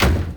porte; OdjazdowyPakiet; Ultranium

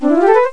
TAX BRUI A; MOBICLIC; TAXIS

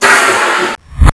chute; HangSim; beach

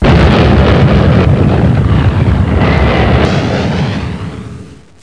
fuse; MOBICLIC

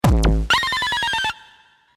bruit electro; MOBICLIC 64

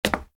pas pave

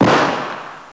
boum

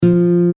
guitare fa; MOBICLIC 77; RATOCLIC

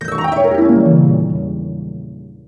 harpe; MOBICLIC

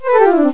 TAX BRUI B; MOBICLIC; TAXIS

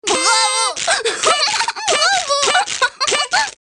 anniversaire; MOBICLIC 65

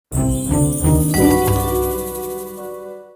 SURPRISE

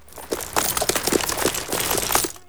Cheval, Bruitages

